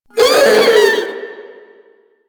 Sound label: Vocal Short Attack; Proto Infested; Warframe; Infested Ninety Nine